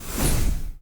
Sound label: ui click flash